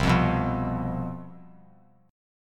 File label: C Major 7th Suspended 4th, down; strummed